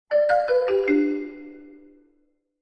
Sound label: lose